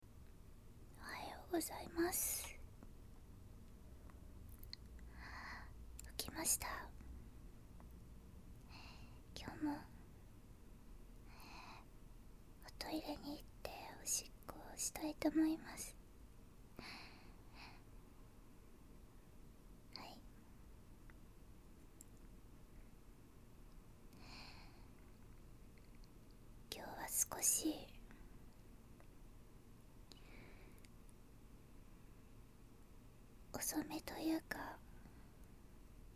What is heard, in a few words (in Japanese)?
朝起きておしっこへ; 寝起きおしっこ